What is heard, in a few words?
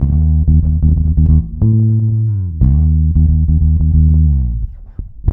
D#, bass; Spectrasonics; Bass Legends; 90 S.BRONX